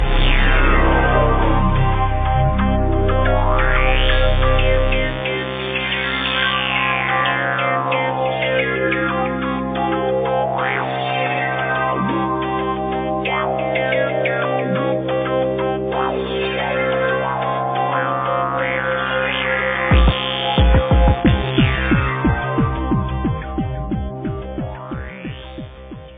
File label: techno tests whispers